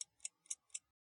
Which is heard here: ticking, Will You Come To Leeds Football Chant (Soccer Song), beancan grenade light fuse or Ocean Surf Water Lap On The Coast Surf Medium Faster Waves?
ticking